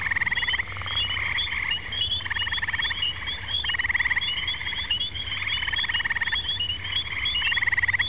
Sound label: gray tree, biota